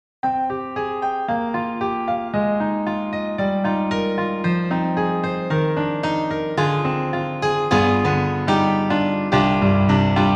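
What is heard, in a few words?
Roland Juno-Gi Stage Piano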